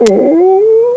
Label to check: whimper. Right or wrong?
Right